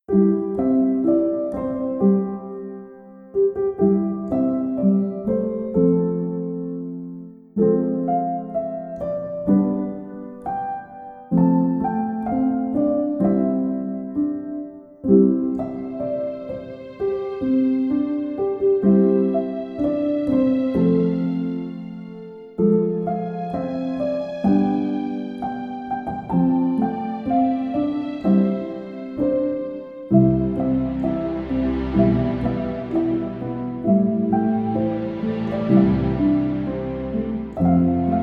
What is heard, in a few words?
jingle bells